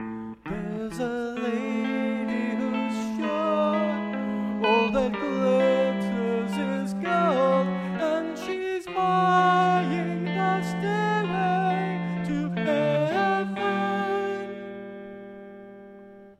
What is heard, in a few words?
twelve tone stairway